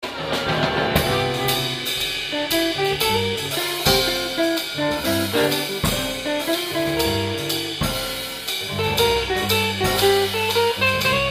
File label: Solo, Guitar Portable Guitar Amplifier